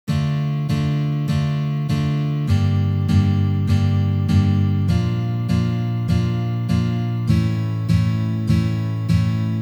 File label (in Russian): Uprazhnenie dlya igry akkorda Re minor na gitare